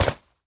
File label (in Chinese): 灵魂土踩踏声